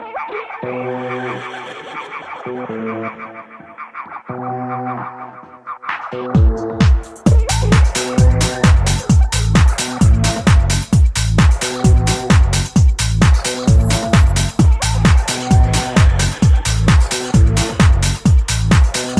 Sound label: House